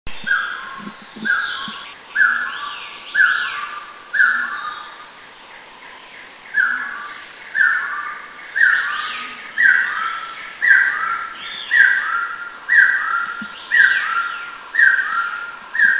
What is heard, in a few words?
Taiwan Partridge